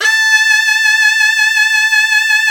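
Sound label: SHENAI, Shenai (Vib)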